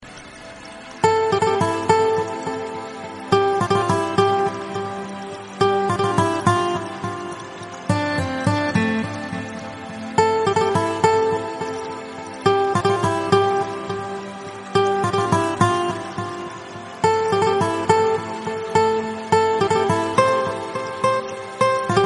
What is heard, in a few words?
romantic rain guitar music tone ringtone; galaxy ringtones